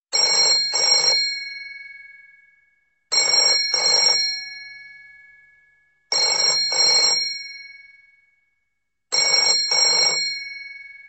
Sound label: Old telephone